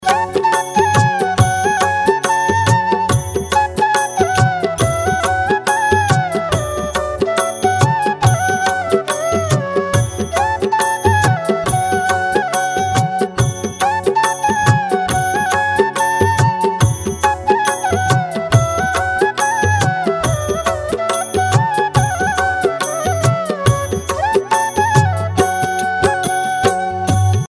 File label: peaceful dhun flute ringtone; Peaceful Swaminarayan Dhun Flute Ringtone